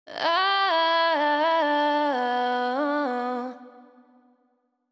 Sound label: Euphoria Ad Lib Wet - 128 BPM E Min; Cymatics; Vocal Sample Pack; Ad Libs